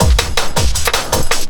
Drum & Bass, 160 BPM

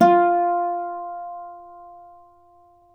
Celtic Harp, Plucked Strings

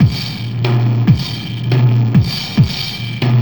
Antimatter Perc, Processed Drumkits; Sonic Foundry; Sony Creative Software; Zero Gravity Beats

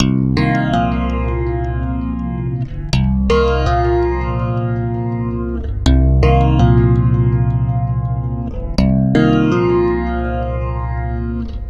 Stick Loop, Stick Bass

